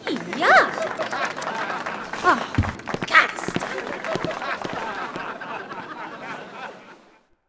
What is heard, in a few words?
dance outro bad; Sid Meiers Pirates; XBOX